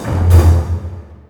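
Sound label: fairground 116; Orchestral Loops; Best Service ProSamples vol.33; AKAI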